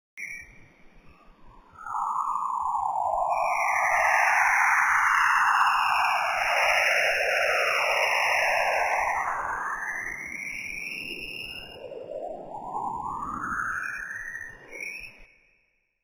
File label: Signal Venus